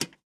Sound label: feature Splash Change; secrets of christmas